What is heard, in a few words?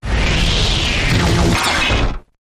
Distorted Noise Sweep